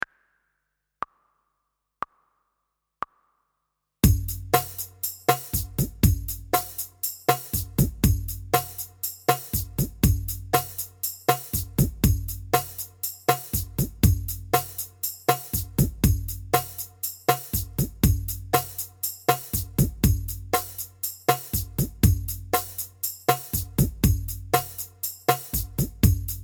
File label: Na Practice with Te Without Tabla